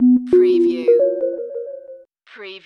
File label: Hip Hop Synth Licks 90 BPM - B - Swing